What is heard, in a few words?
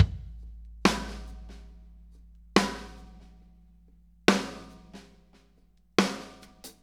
Loop, Drum Tools, Laid Back